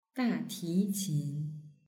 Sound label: datiqin; yueqi